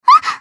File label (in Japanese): 被ダメージ; エミリア